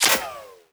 impact helmet ric; Escape from Tarkov

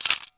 Camera Click; Civ4 beyond sword

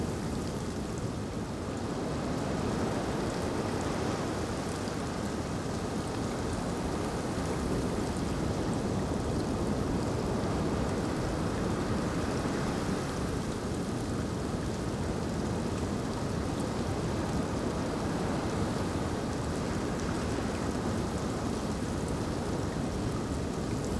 ambience beach day calm drizzle; Rust

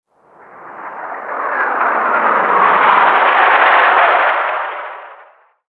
incoming; Explosions; Call of Duty Modern Warfare

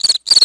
birds; World of Tanks Blitz; hangar 9 may

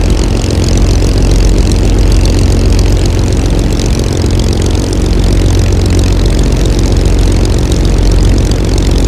Aircrafts Engines Rolls Royce Merlin Ex B; DCS

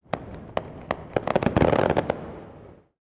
hangar; War Thunder

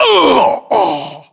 death, voiceovers

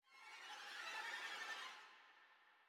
music interactive; World of Tanks

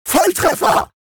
robot Hit Confirmation; World Of Warships

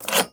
eoka pistol rock scrape; Rust

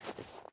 footstep hide snow walk; Rust

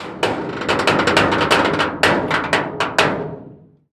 turret, tanks; World of Tanks

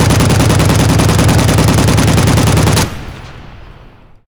plane; World of Tanks

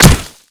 Projectile Sounds; Red Orchestra Ostfront 41-45

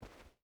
footstep rubber boots cloth walk; Rust

